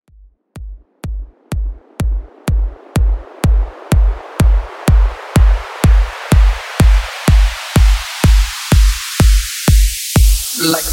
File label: Disco Bootleg, 125Bpm